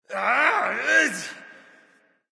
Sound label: lobby defeat vox; smite; chaac